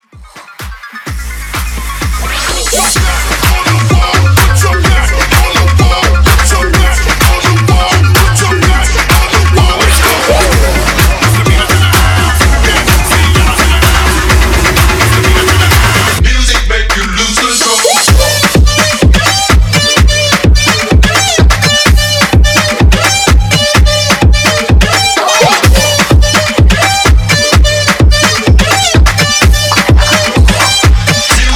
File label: Funk Rework 127 BPM